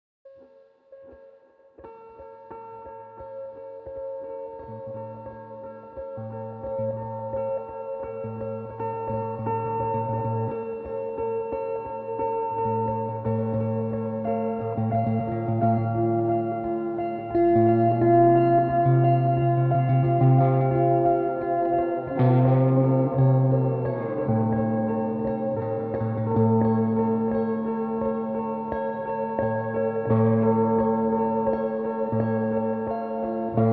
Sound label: Blues